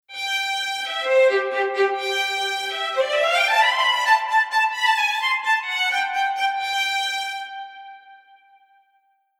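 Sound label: Strings Expression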